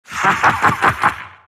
silt laugh; dota; siltbreaker

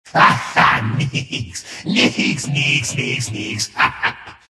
nyx laugh; dota; nyx assassin

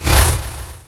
attack post; weapons; dota; elder titan

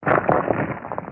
fissure destroy; weapons; dota; earthshaker

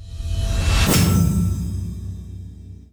pack pickup, cards; ui

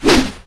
preattack; phantom lancer

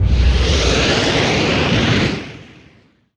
empyrean; weapons; dota; skywrath

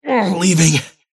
teleport pain; dota; kez

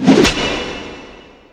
gorgons grasp impact; weapons; dota; medusa

